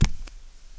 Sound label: move; GrandFantasia